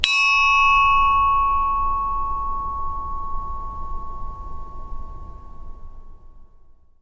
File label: chinese bronze bell side; sackler